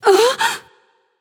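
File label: surprised, female; smite; voicepack; hera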